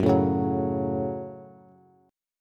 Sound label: Gb Minor Gb Bass; strummed; JGuitar